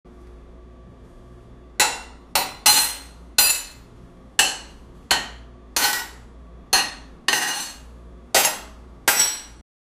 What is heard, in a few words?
there is a plate on, cutlery